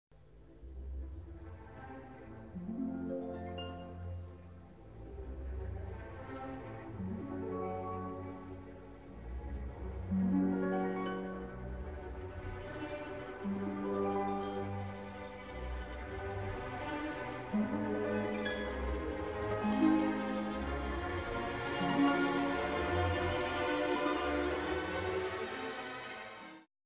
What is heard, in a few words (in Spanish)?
arpa; Sinf.Fantaastica